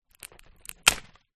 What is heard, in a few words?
seed pod snap break flamboyant tree; foley